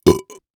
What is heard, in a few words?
Human Burp